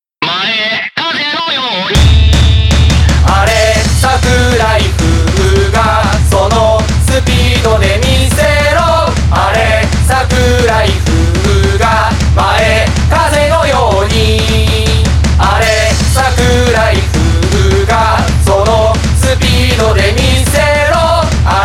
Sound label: fuga